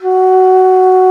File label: bansuri; Winds; Bansuris; E-MU Producer Series Vol. 7 – Old World Instruments